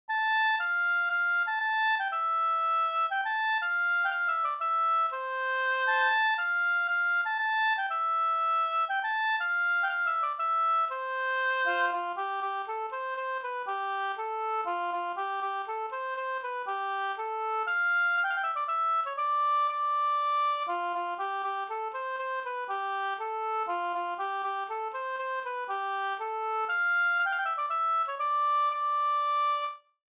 polska Bodapolska

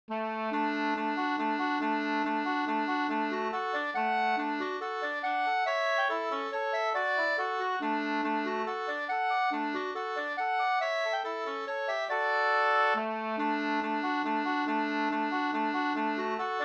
vals

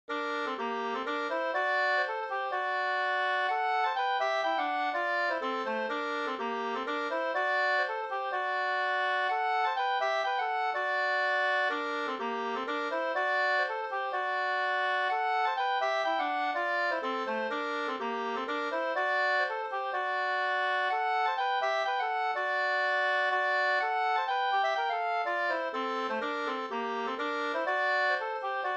polska; e Naktergal Erik Ersson

